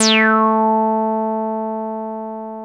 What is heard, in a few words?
303 A3, TB 303; Hollywood Edge; Giorgio Moroder Rare Synthesizer Collection